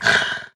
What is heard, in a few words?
tiger struck; mir2; crystal